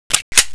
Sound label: Glock slide back; weapons; cstrike